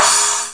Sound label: FLAT CYM